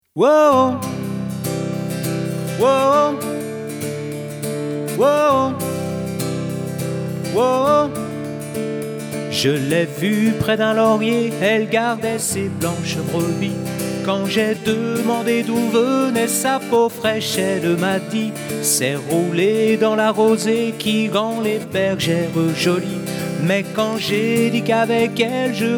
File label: Jingle